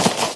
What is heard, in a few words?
snow footstep